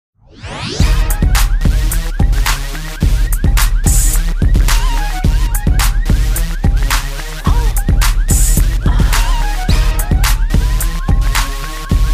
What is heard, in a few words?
Ringtone for Android Phones; Awesome